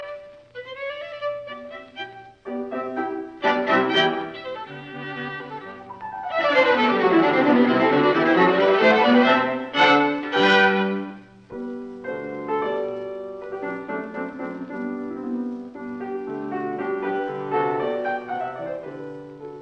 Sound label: Piano Quartet in G minor Rondo Allegro; Budapest Quartet